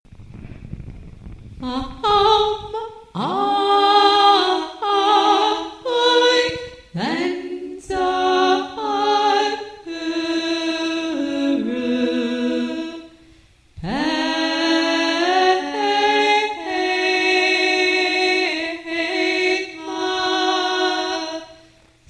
budistas

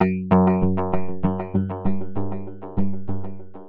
acidos

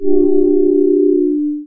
acorde

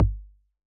tambor

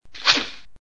Tiro con arco

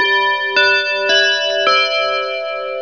musical

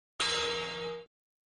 campana

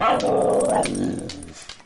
al hacer clic

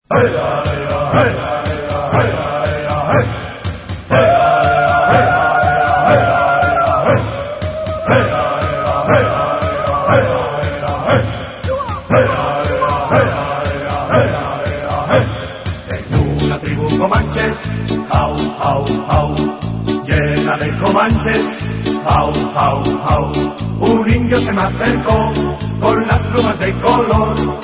canto inidio tribu guerra

